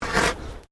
Animal Alpaca Male Snort; Alpacas on a farm in Gippsland Victoria

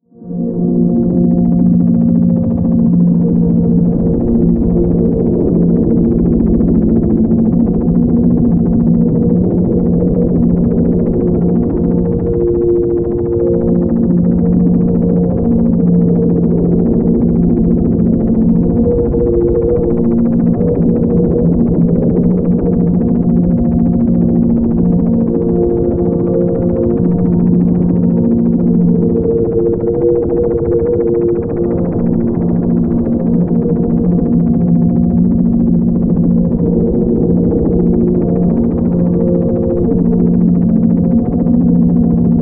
Bed Feedback Chopper; Bed Sound Effects; Blastwave; Production Element Imaging Element Accent Transition

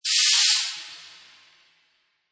Hit Whoosh Static; Scifi Production Elements, Set 1; Science Fiction Sound Effects; Lynne Music

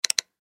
Button Switch

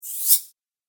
Whoosh; sound design; Swish, Zoom, Swipe, Whoosh, Swoop, Transition

